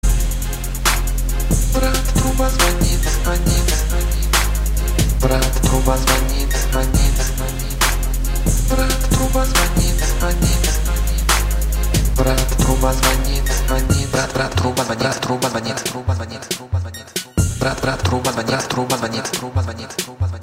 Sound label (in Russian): brat truba zvonit